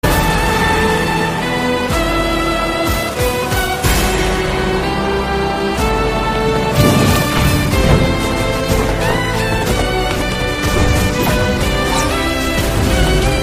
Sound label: sax; zelda totk